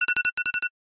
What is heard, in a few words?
radar iphone